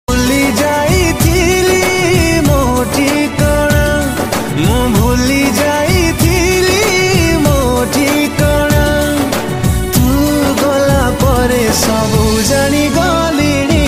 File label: Sad Odia Song Ringtone